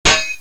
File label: player hit